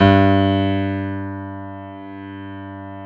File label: PIA PRO, Roland